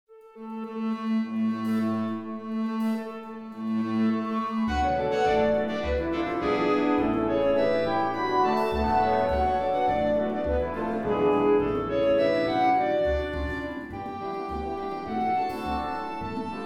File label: concert band